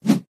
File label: Melee Swing